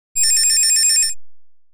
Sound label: telephone